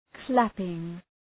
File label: clapping